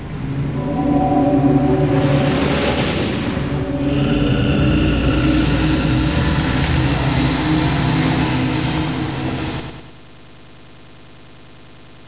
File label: buzz test